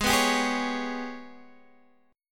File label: G sharp Minor Major 7th Double Flat 5th, Guitar Half Step Down